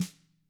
HIT, TAMA SNRD, Drums; ILIO; Double Platinum Drums 2

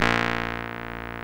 KEY RMI, RMI E.Piano; Roland LCDP10 Keys of the 60s and 70s 2

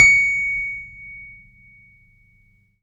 C#7 FF, Piano cp80

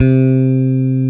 FINGER BASS; AKAI S6000 CD-ROM - Volume 1

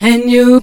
AND YOU VOX, Funky Vocals, SHOUTS; USB Soundscan vol.18

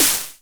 Snare, retro house samples, Drum Hits

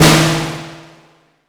SNR, Drums; Acoustic and Electric; Polyend; Simulacrum Sounds; 1 Shots

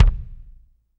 BD, Drums; Bob Clearmountain Drums I

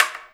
STICK, Percussion; InVision Interactive

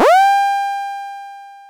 Sfx, Trance; Zero-G Trance Inducer; General Sfx

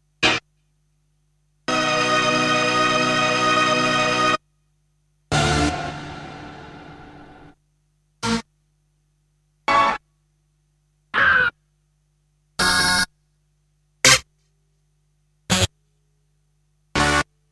Stabs; Kniteforce; 1000 Samples Volume 1

